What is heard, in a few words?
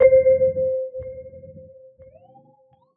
BELL Crescendo (C), Pedal One Shots; Cymatics; ANALOG EVOLUTION Beta